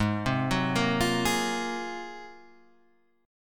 Ab 13th Ab down, Guitar Standard; arpeggiated; JGuitar

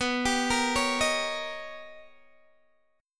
B Augmented Major 9th B Guitar DADGAD

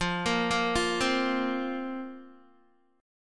F Suspended 4th F, Guitar; Fsus4; arpeggiated; 12,13,10,10,12,x

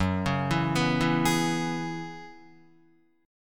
Gb 9th Suspended 4th Gb down Guitar, chord; Gb9sus4; arpeggiated; JGuitar

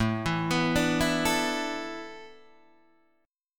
A Suspended 2nd Suspended 4th, Guitar, Open D

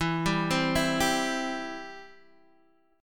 E-Minor Sharp 5th, Guitar, Open D

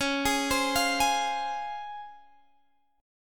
F Minor Db; arpeggiated

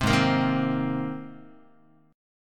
G sharp Major Add 9th, Guitar; strummed; 3,4,1,1,4,x; 4A,4E,5A,5D,5G#,6C#

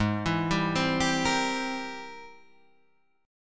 Ab Augmented Major 9th Ab; arpeggiated; x,x,6,9,7,7,9,7; JGuitar

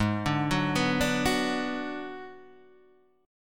Ab Minor 7th Flat 5th Ab, down, Guitar, DADGAD

